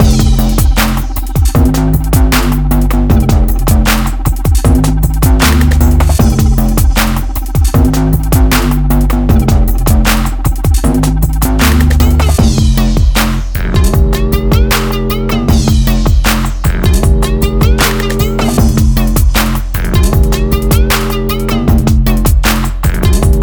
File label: Bass And Trap